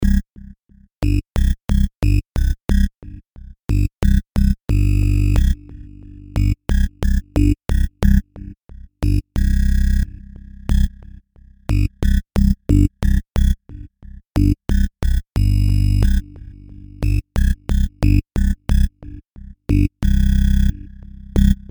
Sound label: Cm90 Big Bloo Groove